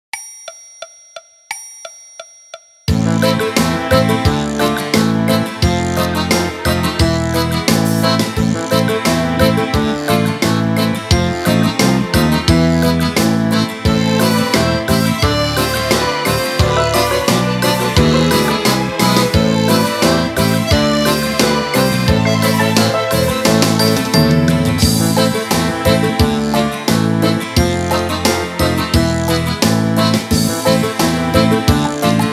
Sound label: Orchestra Version